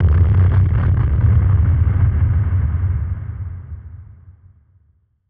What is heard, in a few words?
Drum Bomb; musicradar; bomb factory; Drum Bombs